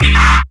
Metals Kick; Sonic Foundry (Sony Creative Software); Esoterik Beatz; Metals Kit

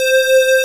Aud Synth C4; Digital Sound Factory Xtreme Lead

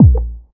BD, B.DRUM, House Side Of 2 Step; USB Soundscan vol.51; AKAI

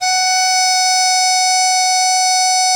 DIZI F#4, Winds; E-MU Producer Series Vol. 7 – Old World Instruments

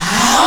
Rev Rigt 2, dry perc; Quasimidi Rave-o-lution 309

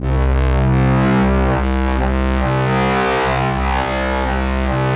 FLYER C1 R, Yamaha VP-1; Music Store; 90's Sample CDs

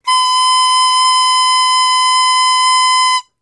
World Winds, Old World Instruments

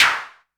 clap, Novation Bass Station 2

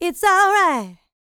LICKS, Vocal R&B Dance; LT DANCE

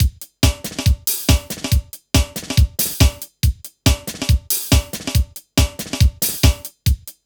Techno; INIS

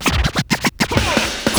scratch; Perc

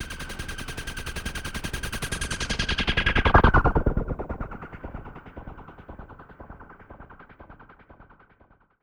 RACER, SYNTHETIC; AKAI Anime Sound FX

